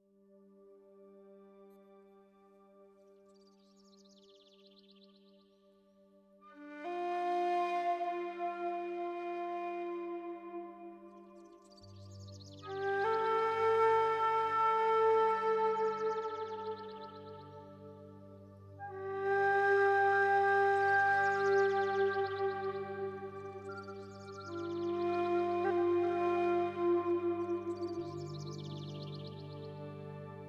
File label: Soothing Birds & Flutes